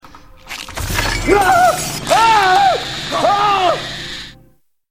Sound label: Spider Attack